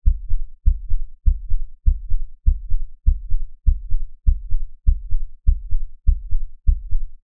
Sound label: human heartbeat fast designed; zapsplat